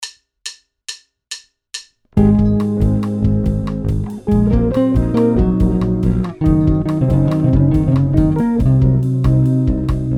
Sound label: Groove & Solo C Locrian, guitar